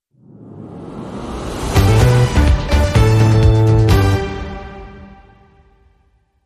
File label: News On, Logo Only Swoosh